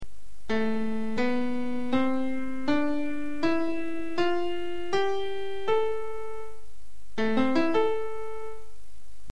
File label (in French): mode la